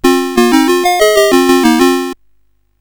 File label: Quari, CSG8580R5